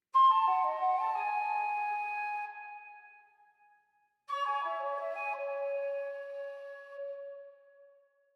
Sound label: Fanfare Flute